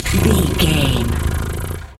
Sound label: cat purr